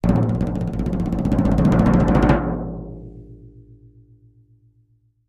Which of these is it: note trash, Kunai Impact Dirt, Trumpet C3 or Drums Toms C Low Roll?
Drums Toms C Low Roll